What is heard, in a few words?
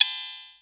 HH Open, Vermona DRM1-MK2 Hi Hat